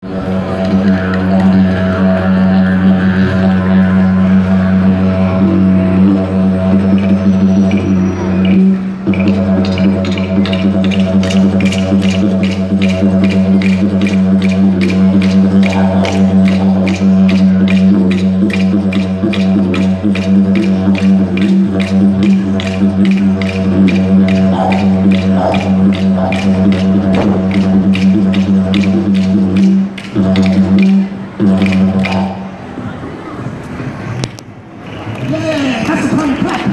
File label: digeridoo